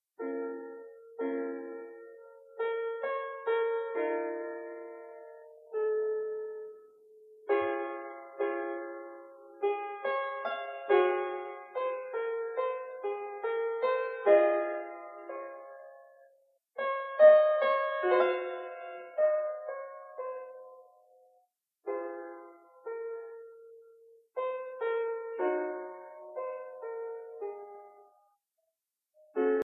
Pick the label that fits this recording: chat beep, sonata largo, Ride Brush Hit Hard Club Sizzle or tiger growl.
sonata largo